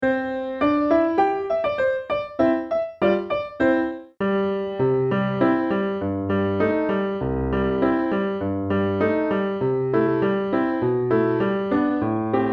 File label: pf